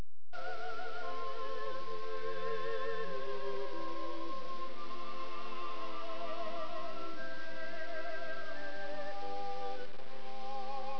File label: FLUTE